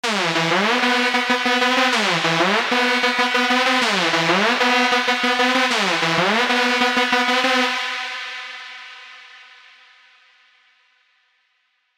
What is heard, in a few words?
Reverb - Synth Lead - Sizzle; Synth Lead 2; CRTIV Reverb